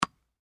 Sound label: Sports Tennis Ball Bounce Single; SFX Bible 6